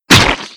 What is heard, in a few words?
Halloween Pumpkin Destroyed; fowl; Halloween Blocks